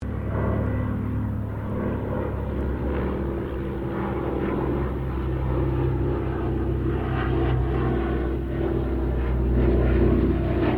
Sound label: AIRCRAFT DOUGLAS DC3 PASS; Qantas 1942 DC3; Airplanes (Sound Effects:Vehicles)